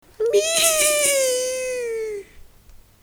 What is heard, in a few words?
Meehihihuuuw